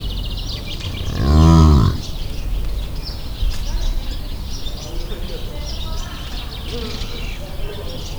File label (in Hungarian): röviden, kardszarvú antilop; debreceni zoo 2012; marantz puska